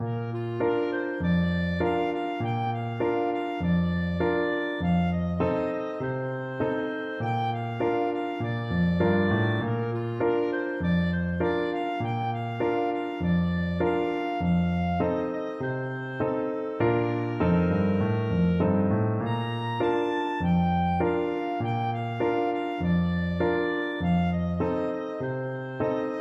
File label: buffalo gals, clarinet